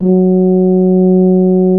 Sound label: tuba loop note